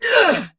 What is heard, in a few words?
Pain, Battle; Warframe; Dax; DaxRanged; en